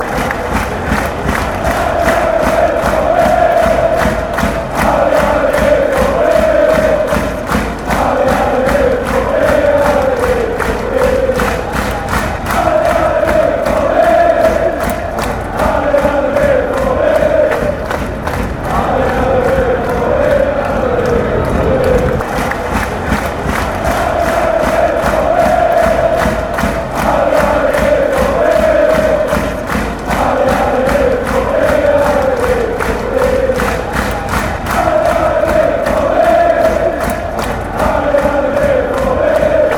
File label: allez bvb fanchants